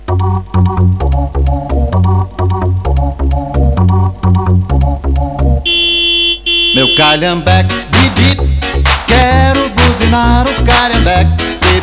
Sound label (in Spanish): automovilismo deportivo musica; autos argentina